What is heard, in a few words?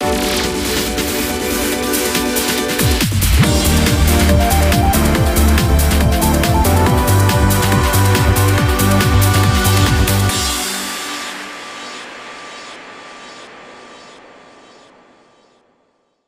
License Get Elec; Gran Turismo 5